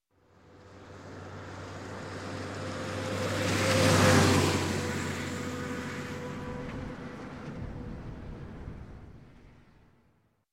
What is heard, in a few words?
Diesel Truck, Pass By, Fast; Sound Ideas 2000 Series